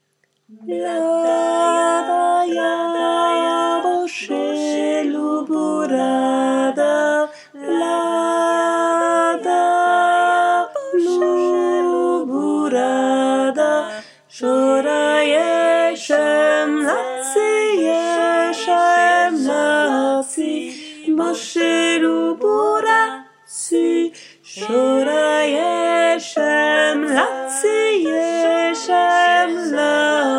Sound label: Pisnicka voix Alto